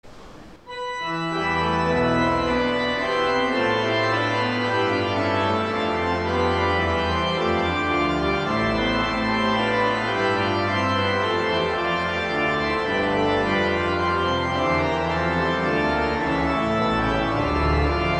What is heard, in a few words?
Organ, Chorale Prelude; Johann Sebastian Bach; 'As Jesus Stood Beside The Cross', BWV 621 (Orgelbuchlein); Fourth Sunday in Lent; St. John's Cathedral, Jacksonville Florida